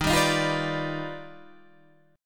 Eb Augmented Major 9th Eb down Guitar Half Step Down